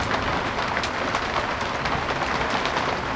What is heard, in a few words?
rain wood, ambience